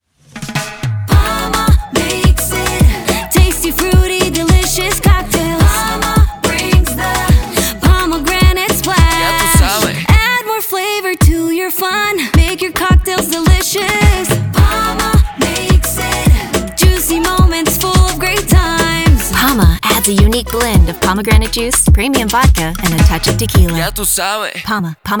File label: jingle